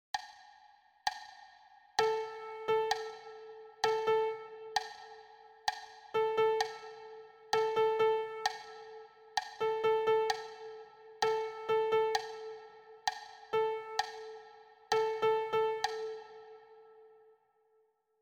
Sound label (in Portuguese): celulas ritmicas simples